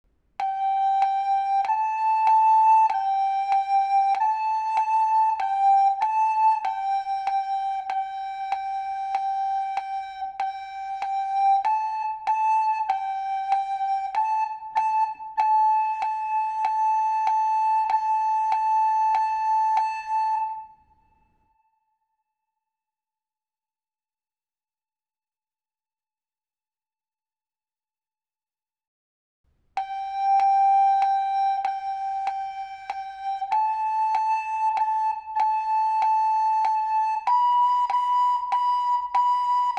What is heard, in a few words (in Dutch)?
Melodiedictee